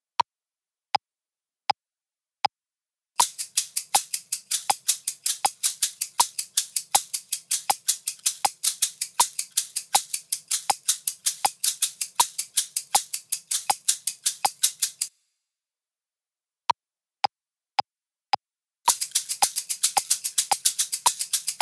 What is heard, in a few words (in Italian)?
Con due shakers